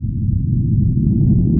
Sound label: dissimilate portal; void spirit